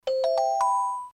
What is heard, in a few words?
Item found; sponge-jeu